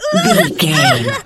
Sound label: voice female death